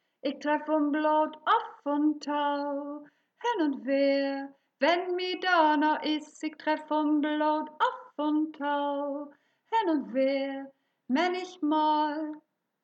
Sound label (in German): Refrain - Sopran